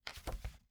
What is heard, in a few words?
Household Book Soft Cover Opening